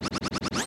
yoshi flutter jump; smw2

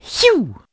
phew; mario; smsunshine

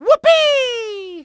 mario jumpspin whoopee; smsunshine

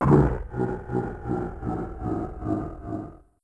bowser laugh; ta-snes

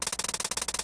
hammer bro; smas-smb3

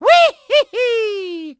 mario jumpspin weeheehee; smsunshine

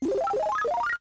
power up; nsmb

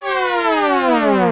falling chomp; smw2

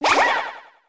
goomba dead

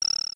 dragon coin; smw

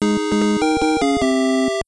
bonus chance win; smb2

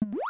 swimming; smw

